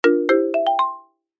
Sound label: multimedia alert notification musical short marimba process finished; zapsplat